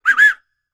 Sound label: WHISTL, ANDES FX World Voices; Spectrasonics Vocal Planet